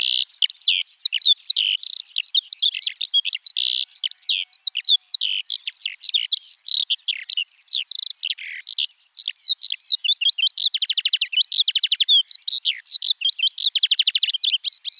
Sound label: alauda arvensis, bird